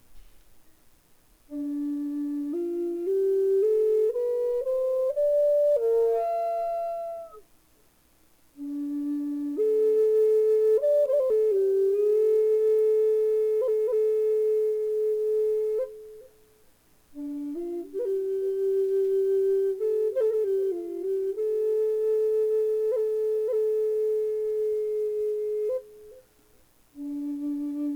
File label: curly cedar d, flutes